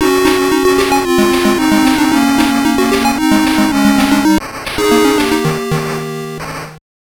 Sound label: Car Scene Clear; jurassic park 1993 game gear